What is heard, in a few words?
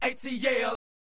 Not Nice Chants, Vox